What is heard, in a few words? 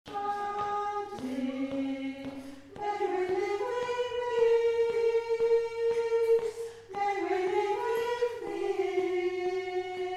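Soprano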